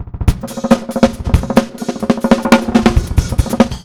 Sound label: ACOUSTIC, Big Beat Drumloops